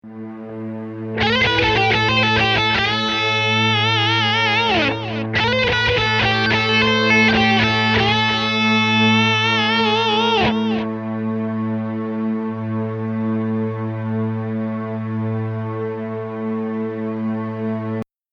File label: Lick